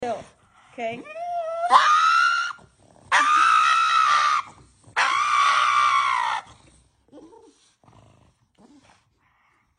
pug screaming

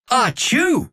achoooo

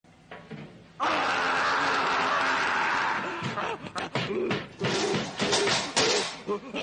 screaming; cookie monster

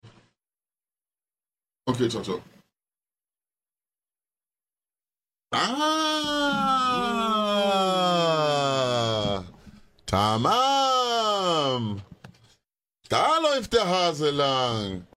aaaahh

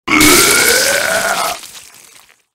smile vomit; item asylum

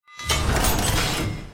factories alert; hoi4 construction

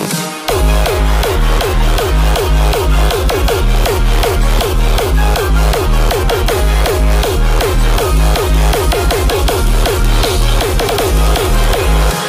classical music

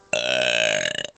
burp

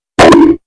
bonk more like smash

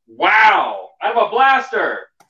from a blaster

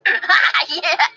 cute laugh

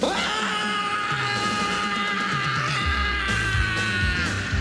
huaaaaaaaaaaaaaaaaaaahhhhhhhhhhhhh

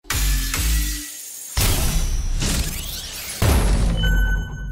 gacha sound full ver; nikke

